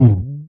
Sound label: bump; Tiny Race